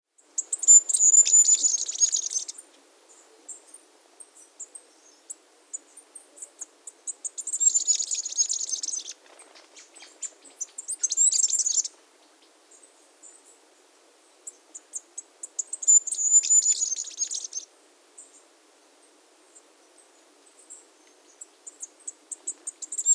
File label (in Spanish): Diglosa De Antifaz, Diglossa cyanea; aves iguaque villa de leyva y sus alrededores